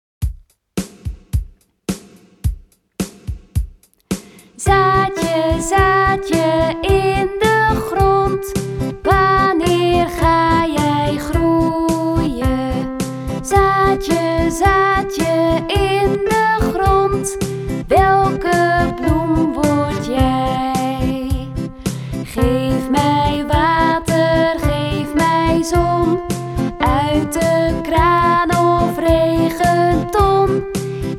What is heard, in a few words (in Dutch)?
Lied, zang en begeleiding; Zaadje in de grond; taalsprong